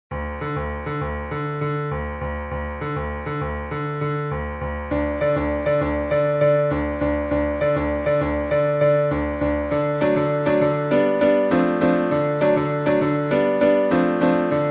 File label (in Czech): ctyrrucka piano solo